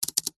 technology computer keyboard spacebar presses fast; zapsplat